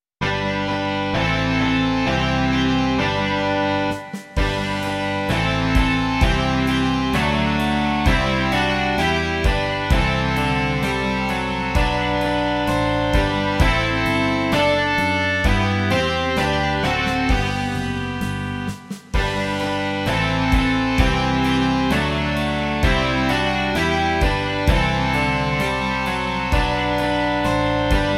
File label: rock